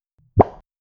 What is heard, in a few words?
soft cartoon thud or bump